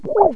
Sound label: gurp; quake2